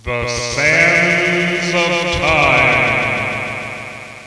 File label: SAND TIME